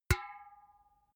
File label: empty can hit hard